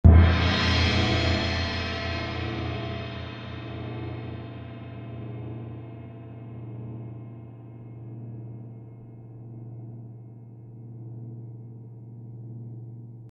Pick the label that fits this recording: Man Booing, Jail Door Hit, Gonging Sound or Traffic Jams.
Gonging Sound